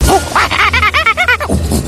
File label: OOOOOOO